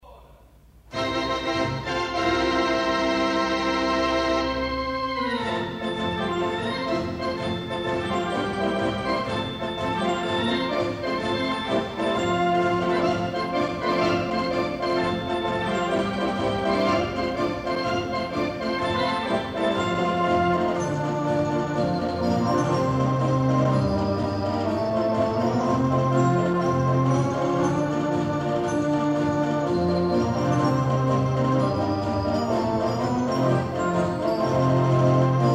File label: cinema organ; Wurlitzer; Granada, Bedford; Since 1934 at the Granada, Bedford, the console of the mighty Wurlitzer would appear